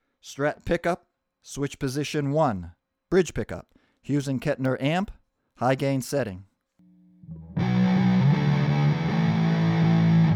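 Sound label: strat bridge high, guitar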